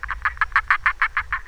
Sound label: Bokermannohyla ibitiguara